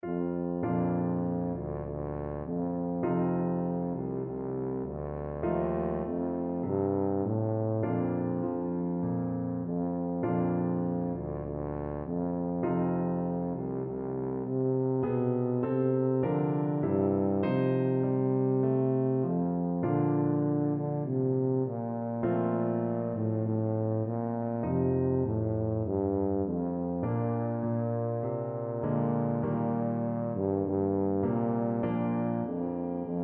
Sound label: america the beautiful, tuba